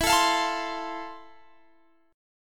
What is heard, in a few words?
F Major 7th E